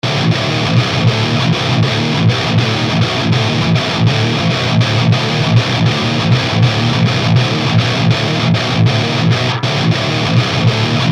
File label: git, sinamp; mbdr sinamp